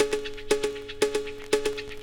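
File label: cowbell C sharp minor 117; percussion loops; shaker background; smoke point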